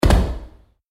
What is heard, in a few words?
footsteps land concrete stairwell